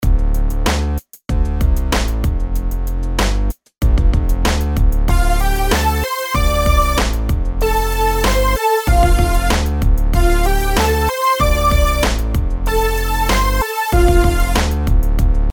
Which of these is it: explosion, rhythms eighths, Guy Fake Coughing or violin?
rhythms eighths